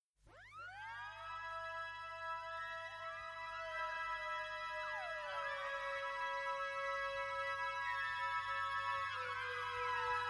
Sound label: Ocean